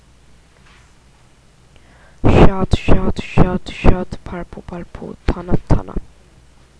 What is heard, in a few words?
shot